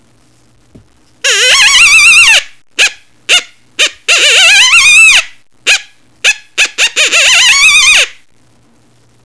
Cri d'alarme de coyote

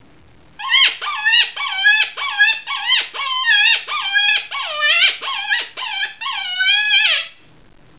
Cri de detresse, chevreuil

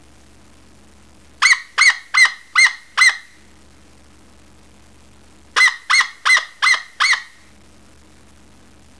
Femelle en Oestrus, chevreuil

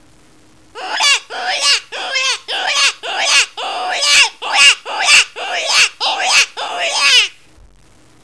Lievre de Townsend, Jack Rabbit

